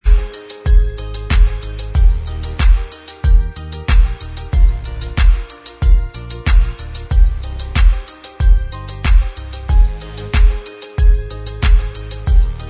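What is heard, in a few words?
Inst Track, instrumental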